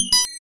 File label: Glitch Sound